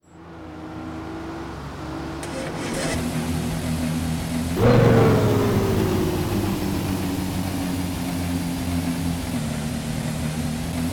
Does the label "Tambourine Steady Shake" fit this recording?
No